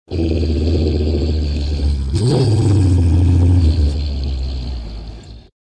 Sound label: moth, monster